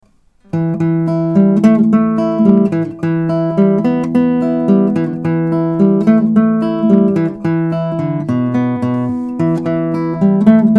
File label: Rock